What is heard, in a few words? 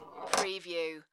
Pinball sound, ball rolls and comes to a stop